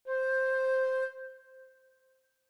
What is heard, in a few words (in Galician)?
do, frauta doce; Dixitacións frauta doce; Tielman Susato; Proxecto cREAgal